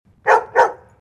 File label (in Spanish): perro; Tres En Raya